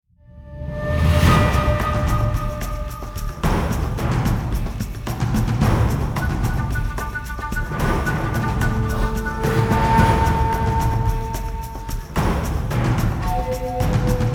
Monster Hunting Combat Layer intro; The Witcher 3 Wild Hunt; Skellige

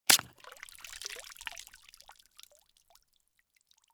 Fuel Barrel; SFX CircusFuelBarrel

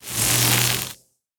fusebox blow; asylum altar; Devour 2021 gamerip pc macos

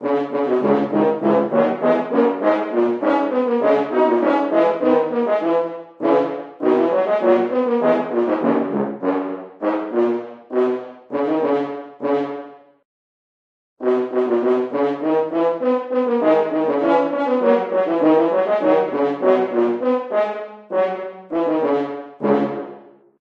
Horn; Zanduvar Carack; The Bard's Tale Remastered Trilogy

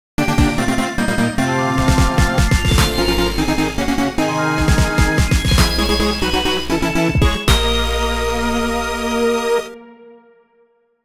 Glockenspiel Obtained; Cadence of Hyrule

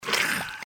Sound Monster Die

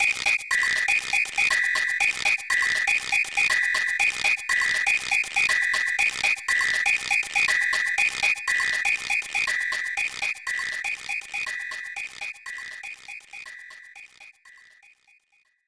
Melody Bay; super mario rpg 1996 snes

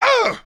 pain; makos

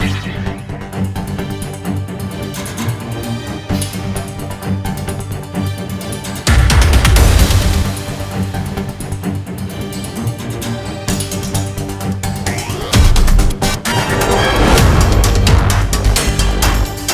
Chopper Attack; modern combat 5 blackout

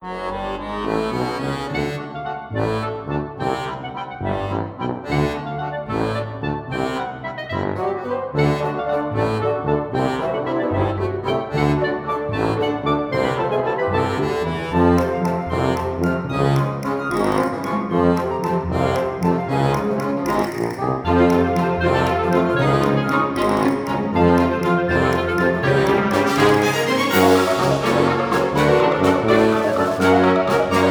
Bonus Level; Leo's Fortune

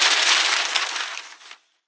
paper fly; Angry Birds Seasons

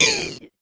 Monster Hurt

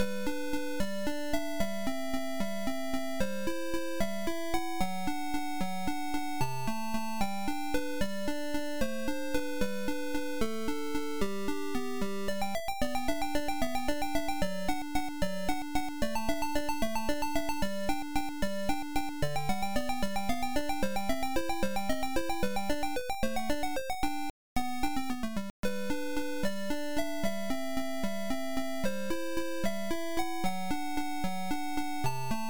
In-Game BGM (Waltz); antarctic adventure; msx; From Waldteufel Waltz 'Skater's Waltz'

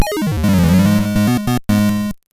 Unknown Jingle; Pocket Bomberman; gb

